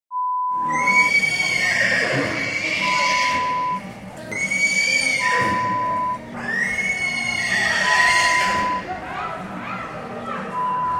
Horse whinnying sound

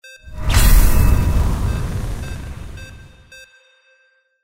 SCIFI MAGIC FIRE RUNE; windy beach audio

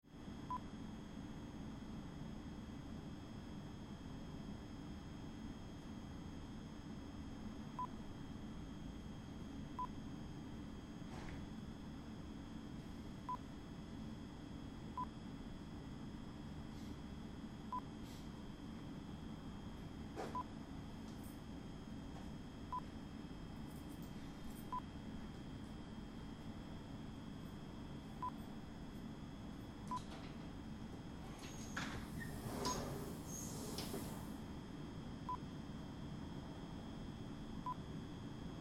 Train Station Stationary Train

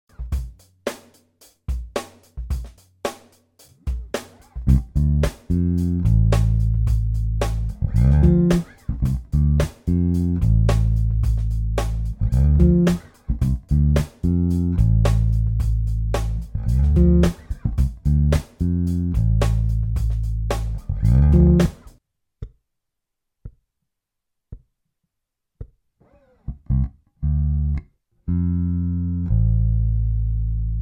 D minor bass groove w doublestop

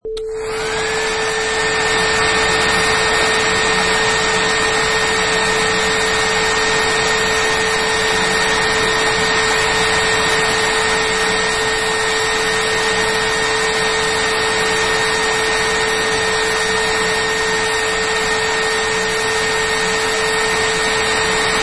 Hair Dryer Still

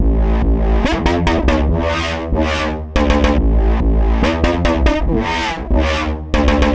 Bass Loop 142 Am

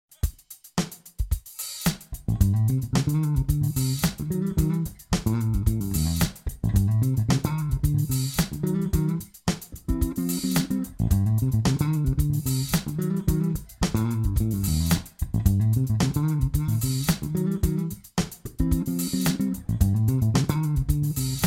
Bluesy solo fills in C major

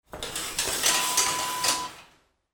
shower curtain pull

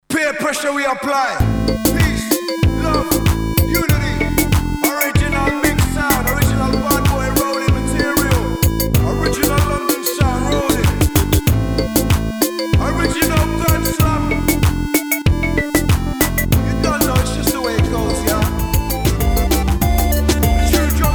Reggaeton

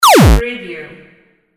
button

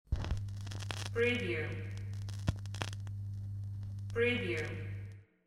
record needle set down

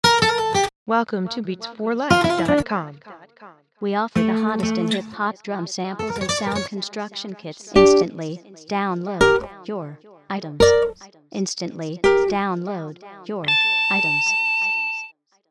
hood stringz

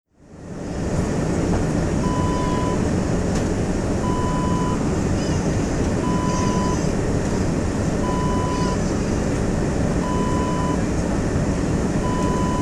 aircraft cabin

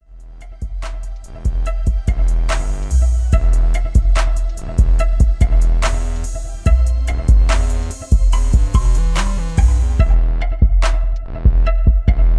72BPM

